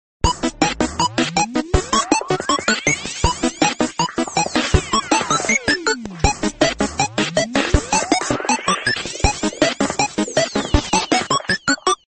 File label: thistle nokia ringtone